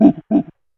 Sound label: Animal, Yamaha DD-20